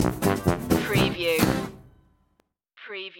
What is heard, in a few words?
cajun stinger